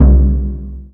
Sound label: MAL PERC, MALAISIAN; USB Soundscan vol.60; Asian Traditions; AKAI